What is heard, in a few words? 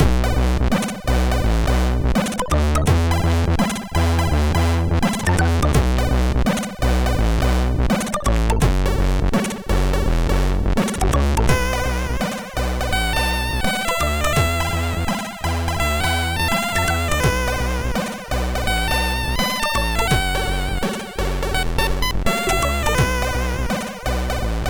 Straaaange, MOS6581R2